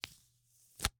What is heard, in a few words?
Slap bracelet, smack, slap, whip, felt, plastic, unravel, release; Eiravaein Works; Vaeyan IV